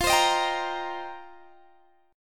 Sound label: F 7th Suspended 2nd Suspended 4th, Guitar Open G